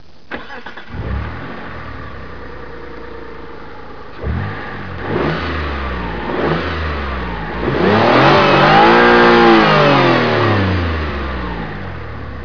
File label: cars